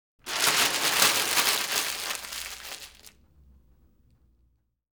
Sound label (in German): papier wird zerknuellt; geraeusche digital